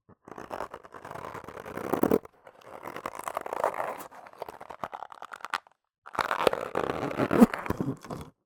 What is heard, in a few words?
foley balloon tiny inflated rubs squeaks movements